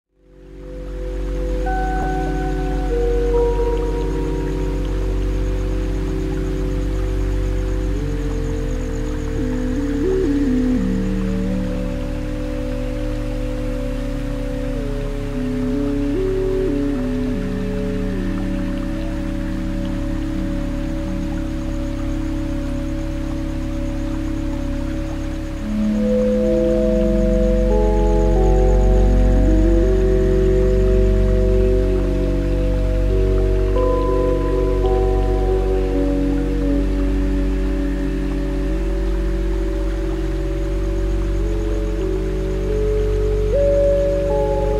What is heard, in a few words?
soundtrack ambience east; hyper light drifter